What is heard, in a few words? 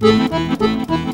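accordion groove; Best Service; World Colours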